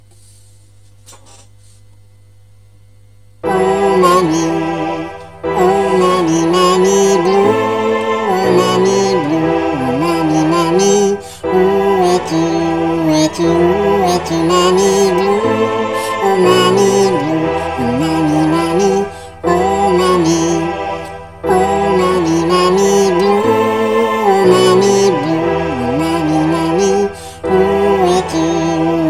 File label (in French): sopranes